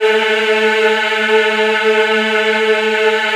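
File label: A3 men women eh, Choir; USB Soundscan vol.28; AKAI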